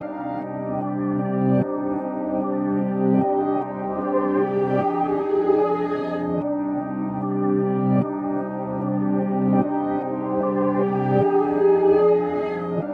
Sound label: 150 BPM C Min Reversed Synth; Trap; Melody Loops; Loop Stems; Cymatics; Nightmares; Abyss